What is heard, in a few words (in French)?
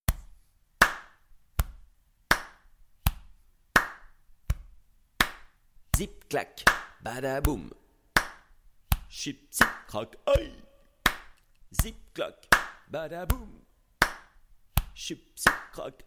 Zip clac ostinato; mots en musique